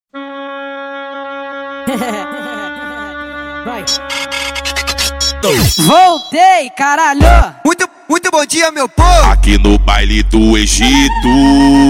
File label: especial funk; cd bad boy som automotivo usa